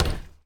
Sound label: Street Ball Glass Back